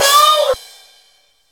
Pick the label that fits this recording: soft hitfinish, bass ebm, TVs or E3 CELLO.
soft hitfinish